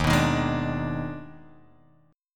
Eb Major 7th Suspended 4th Sharp 5th Eb; strummed; x,x,1,4,3,3,4,x; EbM7sus4#5

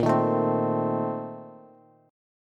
Bb Major 7th Suspended 2nd Suspended 4th, Bass; strummed

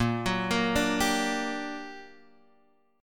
G Minor Major 7th, Bb, guitar chord

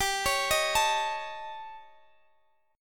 A 7th Flat 5th G

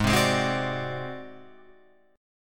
A flat 7th Flat 5th A flat Guitar Open F, chord; Ab7b5 strummed; JGuitar

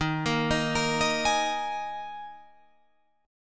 Eb Suspended 4th Eb Guitar Drop D

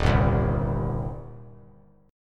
D sharp 6th; strummed; D#6; 3,2,3,1; JGuitar

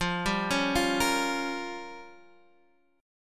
A sharp Minor 6th

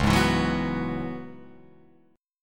Db Minor 11th Db Guitar Open F; Dbm11 strummed; 1,3,4,1,2,1; JGuitar

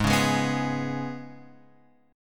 G sharp Minor Double Flat 5th G sharp; {4 4 6 4 X 4} strummed

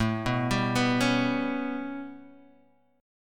B 7th Suspended 2nd Sharp 5th A

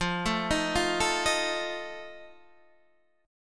F 7th F down Guitar Standard; F7 arpeggiated

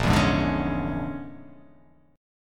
A sharp 7th Sharp 9th Flat 5th A sharp down; A#7#9b5 strummed; JGuitar

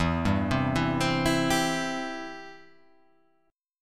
E-Minor Sharp 5th

